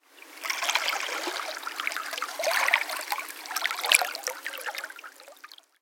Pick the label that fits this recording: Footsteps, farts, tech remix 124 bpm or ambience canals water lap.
ambience canals water lap